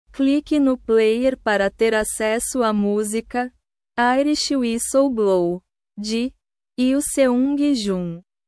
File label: irish whistle blow